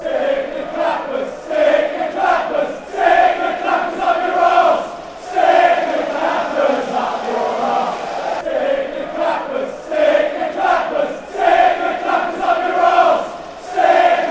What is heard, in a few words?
stick yer clappers, chants